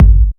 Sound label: Sub Kicks, Bassdrums